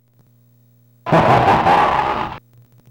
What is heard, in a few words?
HaHaHaHa